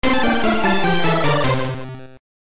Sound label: jingle; Mousehunt